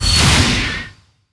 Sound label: Enemy Ufoblock Divide Landing; gamerip